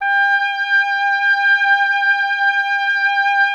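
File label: oboe, wind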